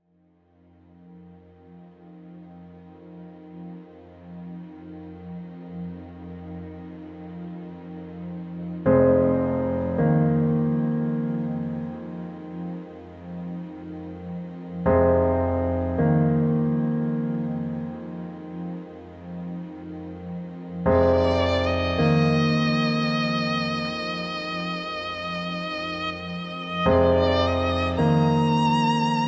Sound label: Winter light piano